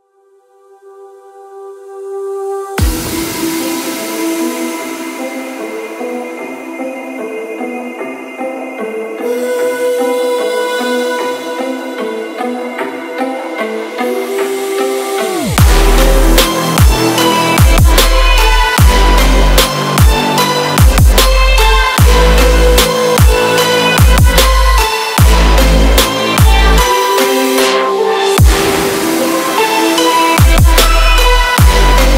Hybrid Trap; Dubstep; EDM Templates; EDM Templates Sample Mega 2026

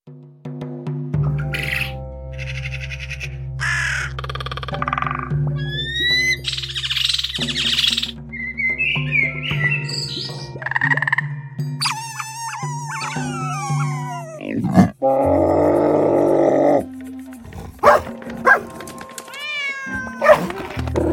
Animals; 鸟叫动物大自然声音; Boom Library; BOOM ONE

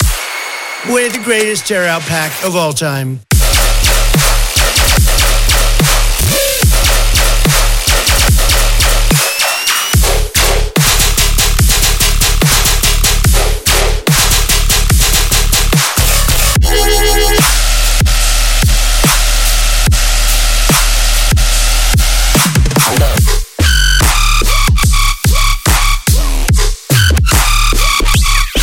Tearout DROP Mashup; XLNT; Tearout Vol 2; XLNTSOUND BUNDLE COLLECTION

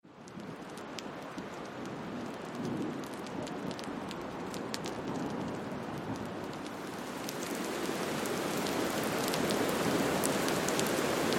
Alien Planet Ambiences and Sound Effects; 外星环境氛围; Bluezone Corporation; 沉浸式的热带雨林声、潺潺流水和山涧溪流声、呼啸的风声，以及质感丰富的夜行生物声 神秘的外星昆虫声、阴暗诡异的动物/有机物声

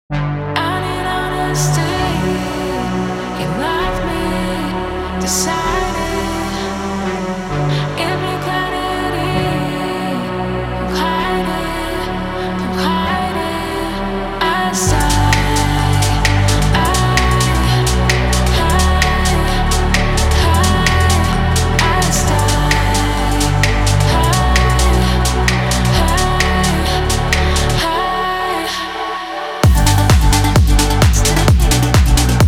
Sad Stutter House; Seven Sounds; Sad Stutter House 2

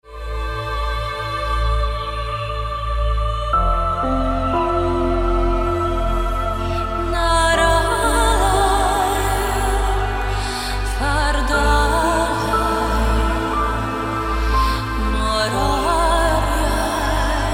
echanted forest, Ethereal Vocal; 电影EDM; 空灵人声; Ghosthack; Shymer Ethereal Vocal Collection Volume 2

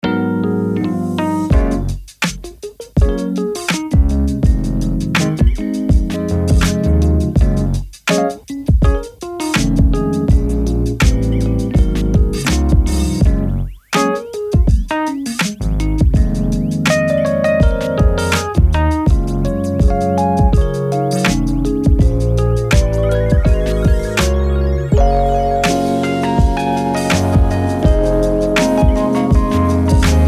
Keep It Moving, Electric Keys; 70年代复古钢琴音色; Native Instruments Electric Keys Phoenix + Diamond; Kontakt

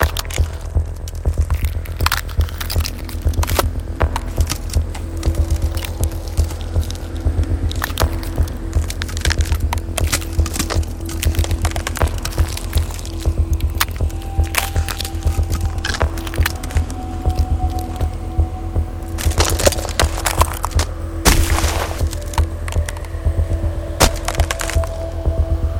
Ice SFX; 冰裂破碎音效; Cinetools; SFXtools; Ice SFX Library

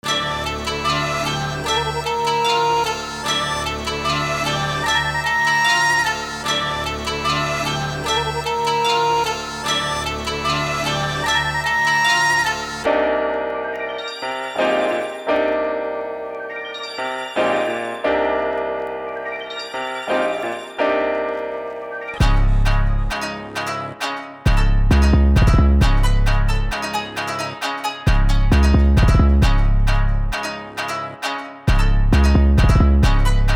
Dark Trap & Drill Trap; Empire Soundkits; Dark Knight Loop Pack V1

